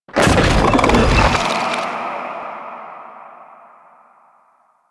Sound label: Sarcophagus Revive; Warframe; Mummy